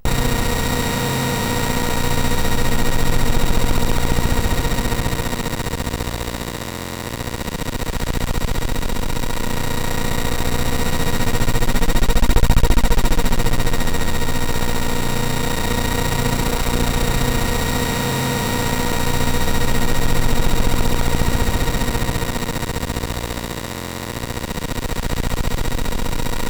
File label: sid CSG8580R5; Bond Alan; 100 percent BASIC Project; No Moving Parts BASIC; hvsc